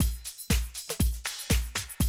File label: 120, Classic Drum Loops